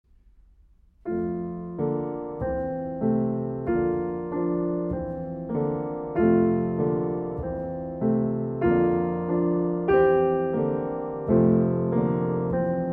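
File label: mary had a little lamb live piano accompaniment; In Harmony teacher resources; EYFS and Key Stage 1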